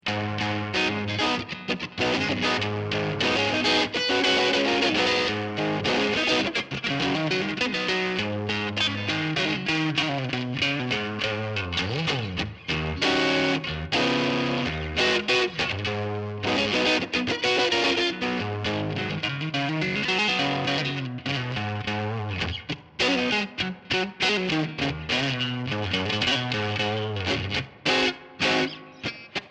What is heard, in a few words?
strat